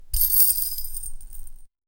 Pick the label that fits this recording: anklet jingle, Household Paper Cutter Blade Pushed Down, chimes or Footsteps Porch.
anklet jingle